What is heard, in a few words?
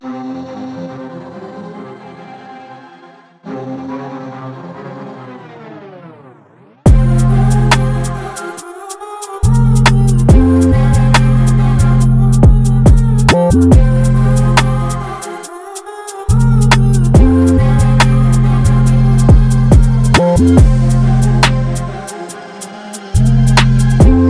Drill beat